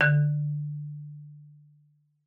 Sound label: D3, marimba; Xsample VOL-4